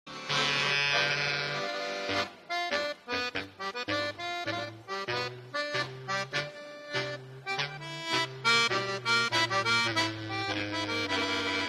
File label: blues